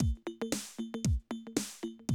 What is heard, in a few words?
Classic Drum Loops